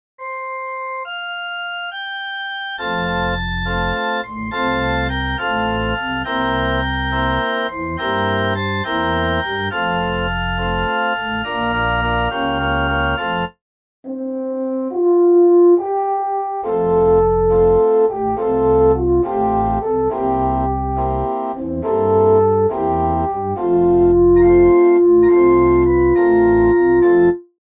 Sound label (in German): lonely ohne floete